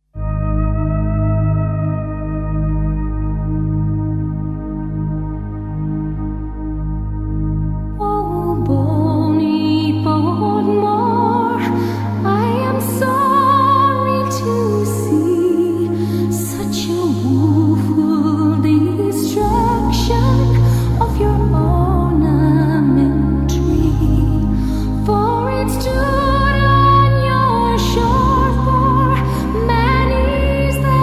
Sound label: Waltz